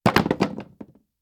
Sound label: fence fall; Nick Summer Sports Stars